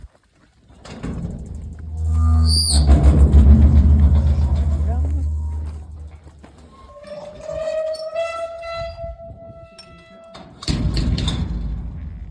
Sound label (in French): NOUVEAU ENTREE, PORTAILS; VERRINES SOUS CELLES (79) Le Luc (nouveau)